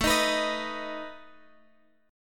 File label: A Suspended 2nd Flat 5th A down Guitar Drop D